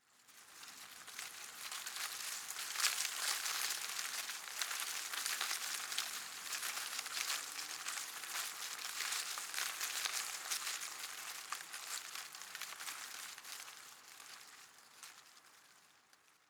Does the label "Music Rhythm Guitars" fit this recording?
No